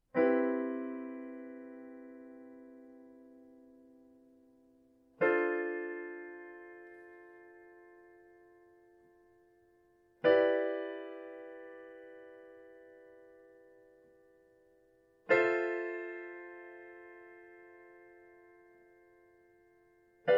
Diatonic Seventh Chords

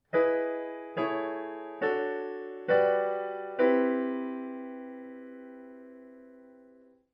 Circle of Fifths harmonic motion

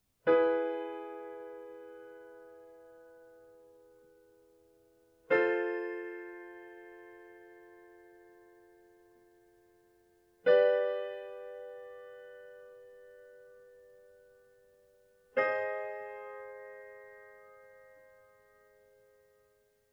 Modulating by half steps

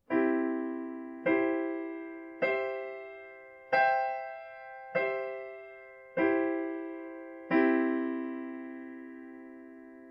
Dead weight drops

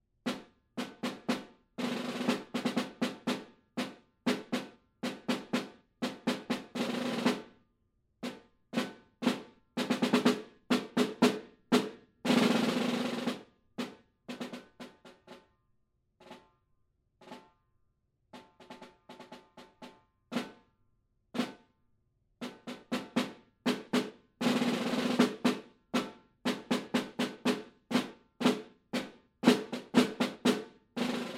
Snare Drum

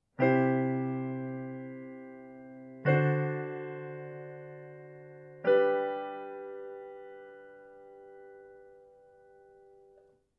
Modulating from C to G major

